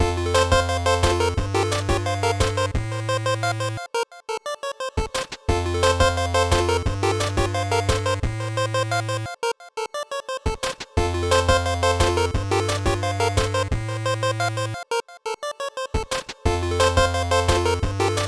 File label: Light Loop